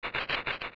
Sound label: Bug Dig; zelda; OOT